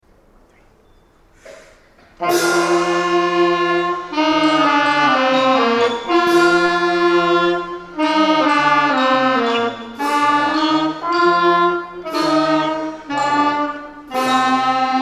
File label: musette